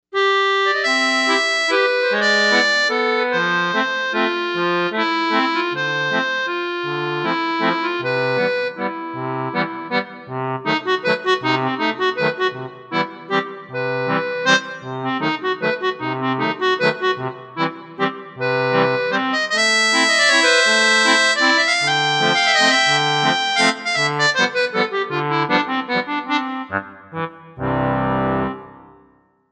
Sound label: Accordion, Korg M1; Factory Patches